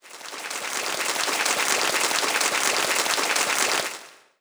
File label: Roland SC-88 Applause